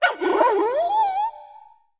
Wink Fox Play

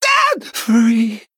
Male Human Death

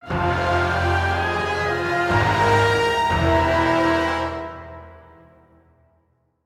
Legendary Stinger; Jace Darkweaver

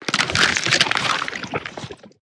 Serpent Egg Attack

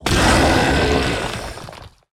Rock Rager Death

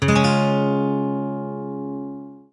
Attack Strum Underlay; Boisterous Bard

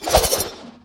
Attack; Defias Cleaner

